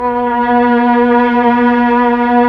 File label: STR, Melo. Violins, Tron Violins; Roland LCDP09 Keys of the 60s and 70s 1